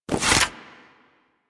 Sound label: Staff Unequip; Warframe